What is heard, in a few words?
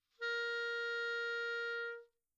normal, clarinet